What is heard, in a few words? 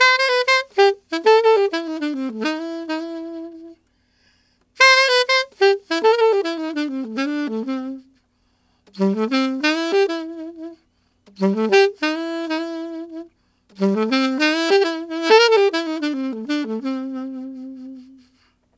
Alto RnB C maj, Sax; Sonic Foundry (Sony Creative Software)